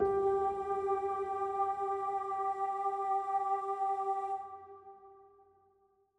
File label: Cave Music, Energy Harmony Note G3